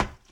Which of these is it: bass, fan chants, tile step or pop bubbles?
tile step